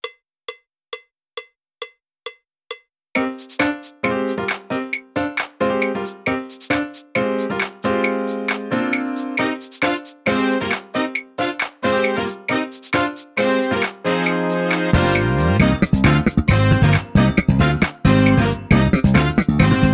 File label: drumless